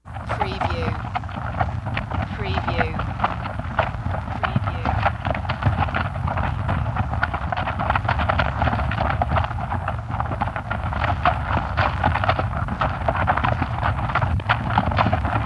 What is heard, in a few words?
Cattle Trudging